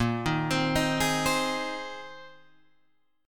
A sharp 7th Suspended 2nd Suspended 4th, Guitar, Open G